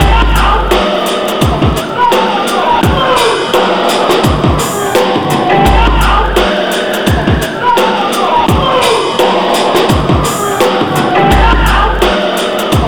85 bpm, Breaks; POTBreaks

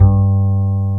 BASS G1; AKAI S-Series CD-ROM Sound Library VOL-8

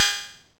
OpenHH, Hihat; Bitkits; Drums

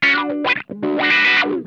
RUDE WAH, Funk Guitar; 90 s Sample CDs; Zero G

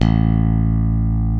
LOW A, BASSES; Kid Nepro - Elite

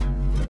LIQUITAR; Spectrasonics; Metamorphosis

